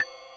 Rhythm Perc Ride, Realistic Concertmate-670 drum machine